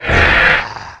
Trex Wound

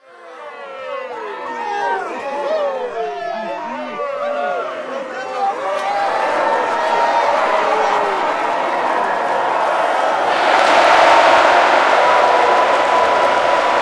Arena Crowd Cheer Crescendo; Horde

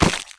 Falling Damage

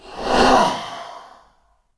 Ghost Attack

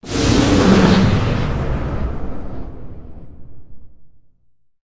Druid Berserk; Spells

